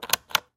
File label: steam click; pond5